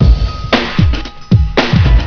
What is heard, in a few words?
ding